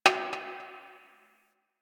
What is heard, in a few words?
Garbage Metal Can